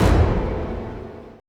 AMBI FX

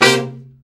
BRA SECT; BRA SECTIONS

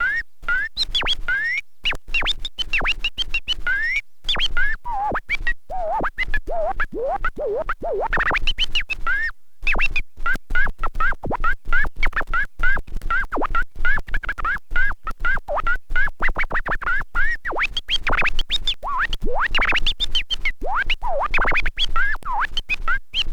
scratch long, Vinyl Attack, scratches

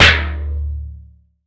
Tin Tray, household rhythm samples hits

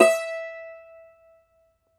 cumbus saz E, plucked strings

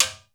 TECHNO, DrumBase5000; 90 sSampleCDs; Crypton - DrumBase5000

